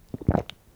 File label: swallow